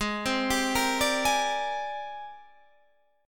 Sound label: G sharp Major Sharp 11th, Guitar Drop D